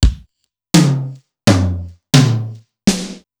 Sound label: Simmons SDS2000; Factory3